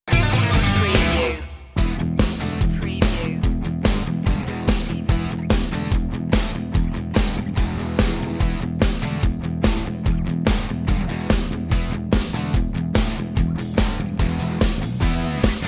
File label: instrumental